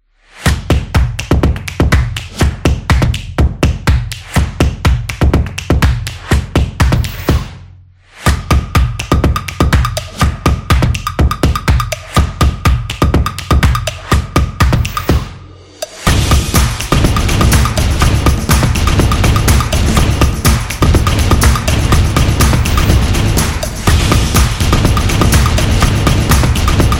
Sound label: Big Beat